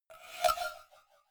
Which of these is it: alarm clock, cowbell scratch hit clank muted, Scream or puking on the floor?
cowbell scratch hit clank muted